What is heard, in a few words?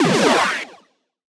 Retro Portal Crossing FX; Video Game; Cymatics; Video Game Sample Pack Vol 1